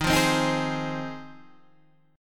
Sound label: F Minor 7th D sharp